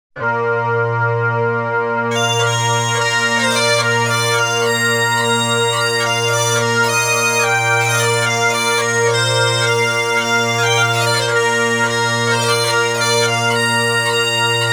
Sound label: Scotland the Brave Trad. Scottish; Soundzabound Volume 2